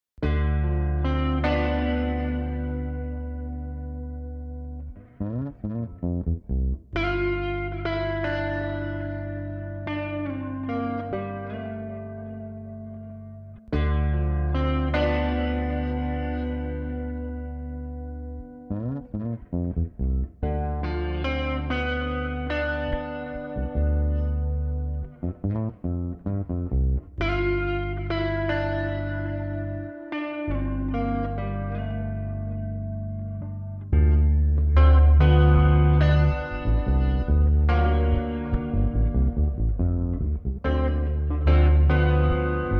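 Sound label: Street Guitar Bass Cinematic, 120 bpm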